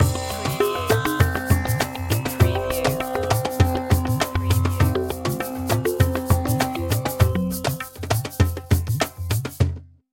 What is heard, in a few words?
Musical; Production Element; Sound Effects Bible